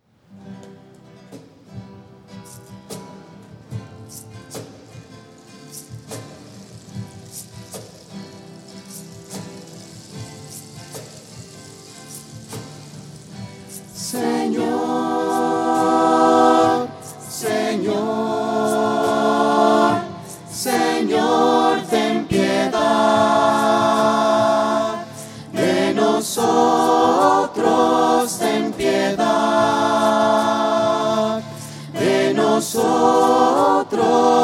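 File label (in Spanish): señor ten piedad bodas voces; misa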